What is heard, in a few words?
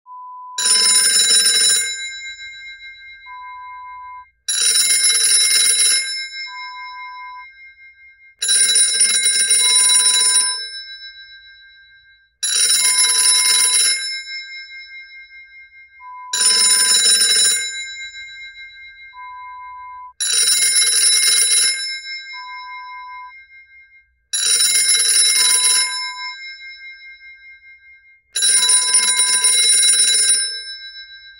Old Fashioned Telephone